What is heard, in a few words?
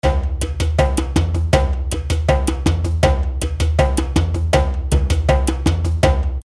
Bomba, trommeln